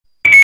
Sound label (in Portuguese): som confirma